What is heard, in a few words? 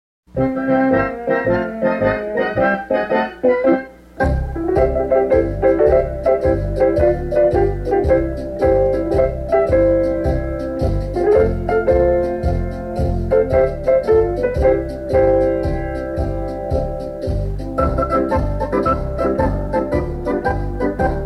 instrumental